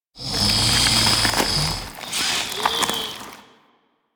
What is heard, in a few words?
Breath; Ambience; Props; Infested Hub; Warframe; Infested Eris